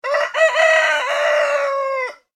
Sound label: animals cockrel crow, Cockerel/Rooster Crow; ' Cock - A - Doodle - Doo '; Birds; McKinney Sound